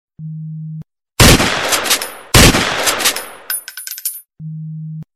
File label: Shotgun Ringtone